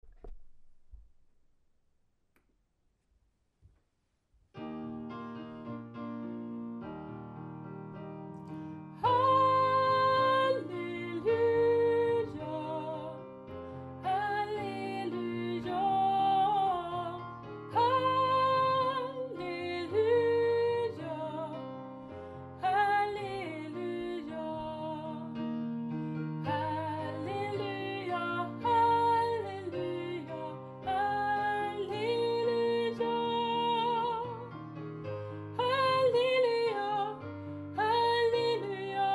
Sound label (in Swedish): dina hander ar fulla av blommor refr sopran